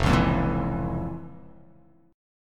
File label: F Major 7th Suspended 4th Sharp 5th